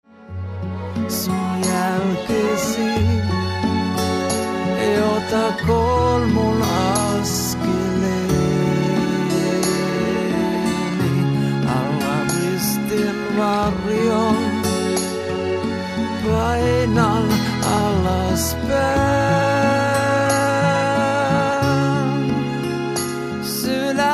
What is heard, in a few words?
unplugged